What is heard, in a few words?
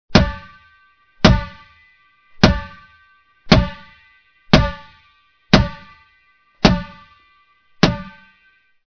Rubber ball bouncing